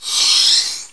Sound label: Larva